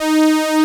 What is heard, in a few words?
Moog modular; Greytsounds VOL-1